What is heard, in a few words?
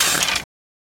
bow cancel